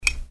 Lamp Switch Off

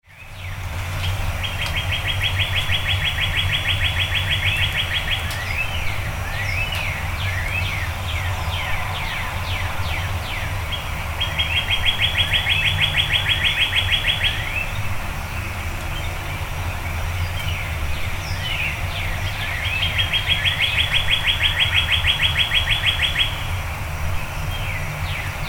Birds Before Dawn

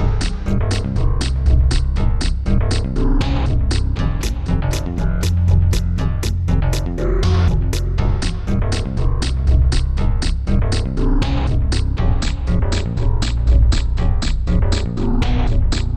Quirky Construction, puzzle music

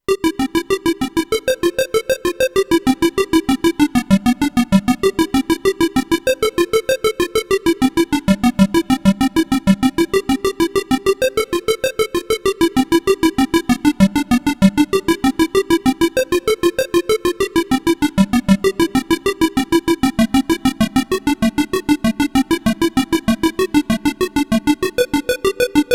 8 Bit, looping music

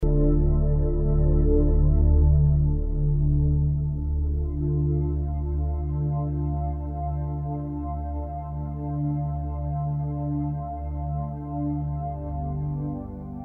Ocean Floor Looping

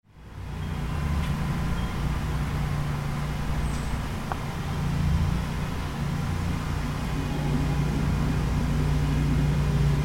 Suburban Neighborhood

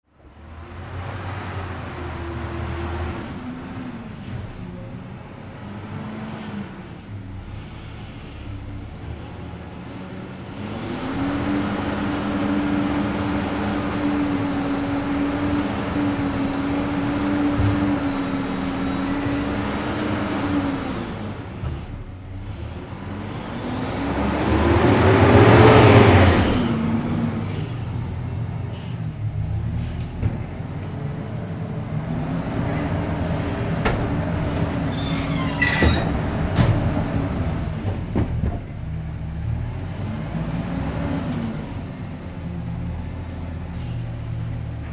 Garbage Truck

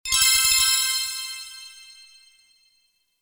Bell Chord

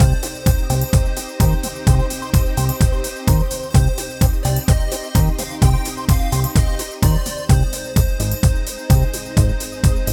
Arcade Drama, chiptunes

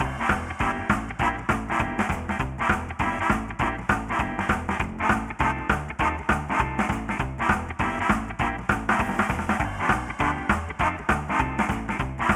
Slapstick LoFi

